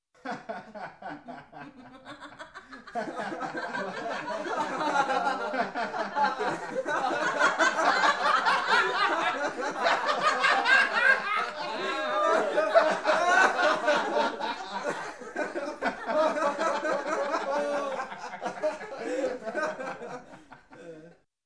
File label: Group of people gradually laughing